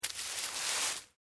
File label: Foley Sand Pail Dump Small Shovel; Playgrounds (Sound Effects:Backgrounds)